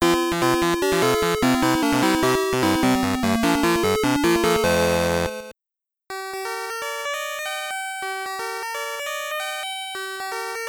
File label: Puzzle Clear; Picross 2; SGB